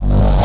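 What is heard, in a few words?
drum kick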